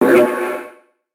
pain; Dullahand pain2; Fight Knight

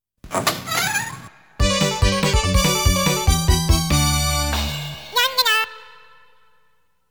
Fanfare of Congratulation; Konami Game Music Collection

